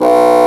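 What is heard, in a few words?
Contra Bassoon, B0; Digital Sound Factory Virtuoso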